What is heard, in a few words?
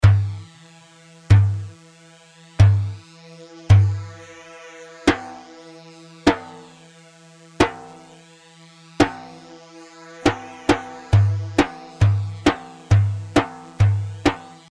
Merjaf; Merjaf 1 مرجاف